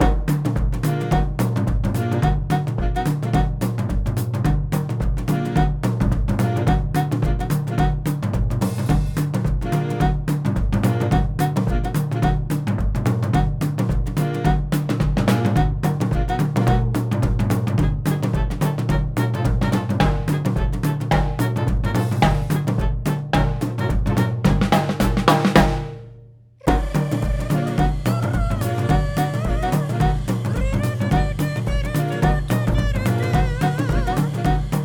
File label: jazzfluenza E